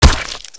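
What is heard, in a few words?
machete hit body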